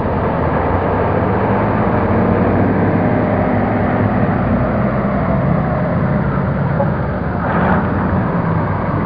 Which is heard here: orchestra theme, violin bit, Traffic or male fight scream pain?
Traffic